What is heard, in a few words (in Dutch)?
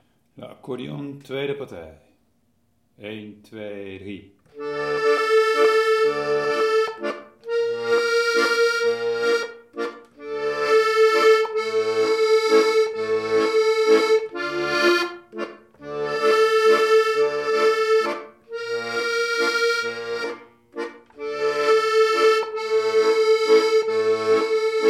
accordeon tweede partij